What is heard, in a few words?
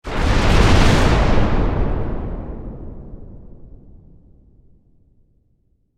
Explosion Massive